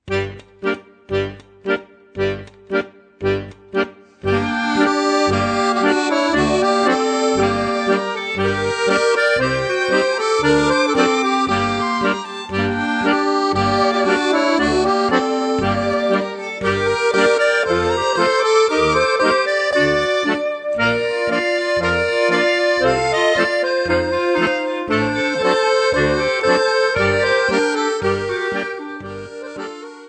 Armenien, Accordion; Sev Acherov Aghcheek

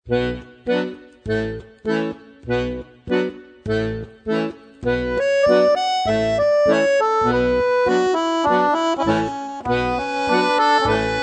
Rumänien, Accordion; Joc Batranesc; Accordion Trip 2

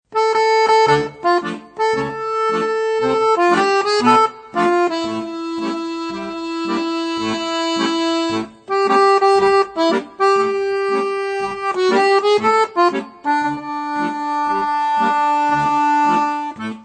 Akkordeon; Akkordeon Workshop Band 2

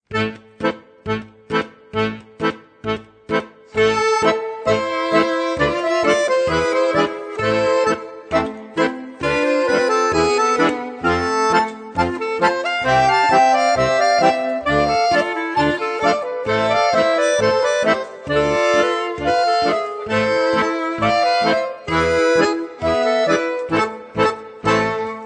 Russland, Accordion; Gorbitschok

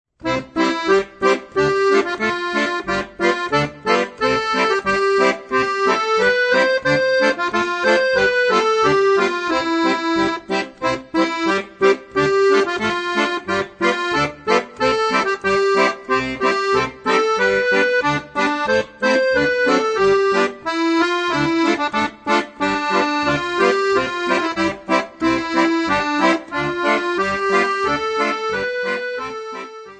Marsch, Polka, Akkordeon; Akkordeon Workshop Band 1

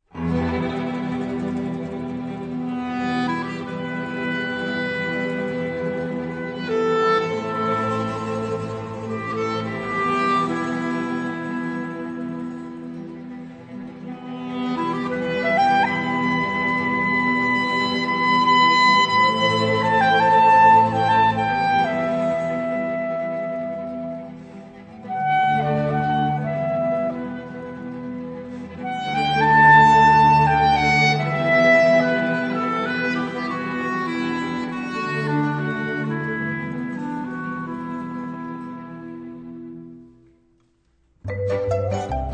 Junge mit Tambura Bulgarien, Accordion

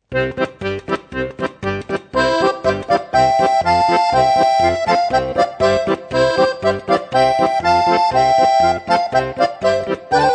Bulgarien, Accordion; Dobro utro

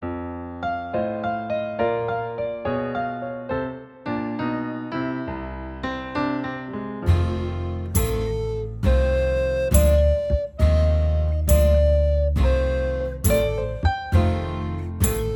Blues

